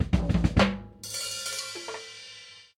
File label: Drum Trip